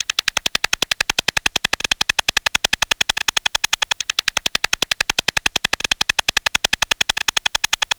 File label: Bacterial Beat, Electronic Beats; Sonic Foundry (Sony Creative Software); Myths of Technology